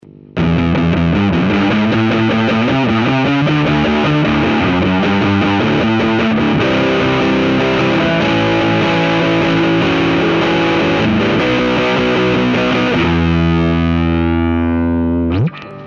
Facial Fuzz

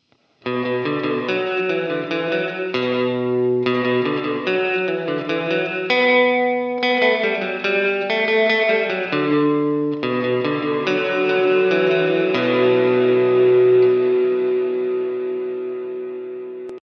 Hall

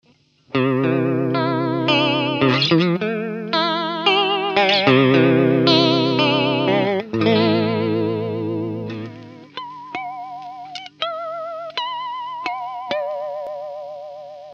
Pitch vibrato

